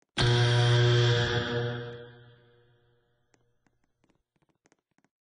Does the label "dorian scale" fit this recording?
No